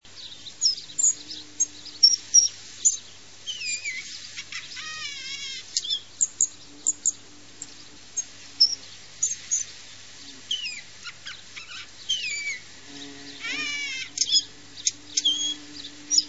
stenknack

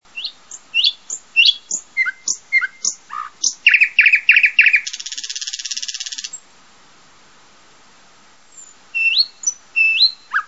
naktergal

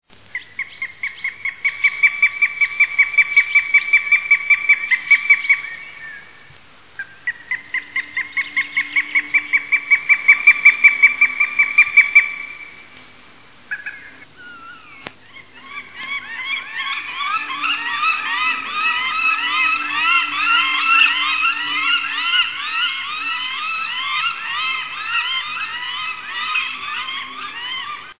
duvhok

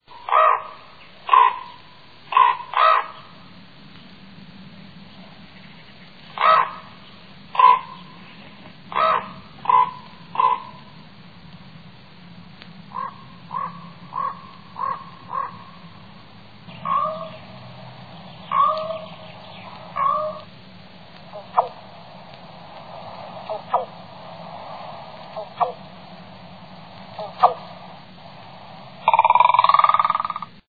korp

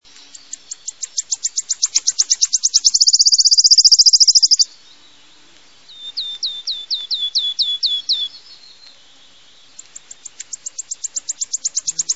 gronsangare

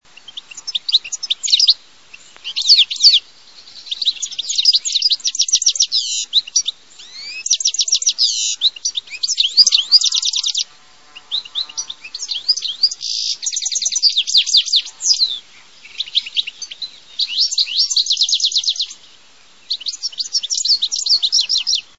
steglits

